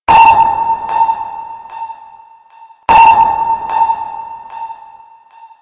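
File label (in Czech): Sonar Ponorky, submarine sonar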